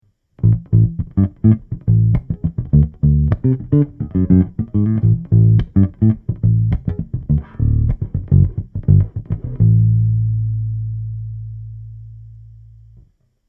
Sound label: Pure Fingerstyle Oldschool Mic, Bass; Markbass MB58R CMD 102